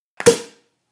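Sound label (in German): Flasche öffnen Sound Effekt Sektkorken; sektkorken knallen; flasche oeffnen sound effekt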